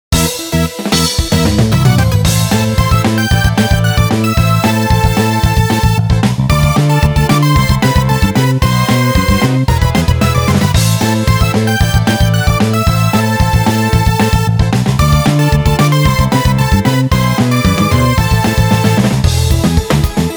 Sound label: middle boss battle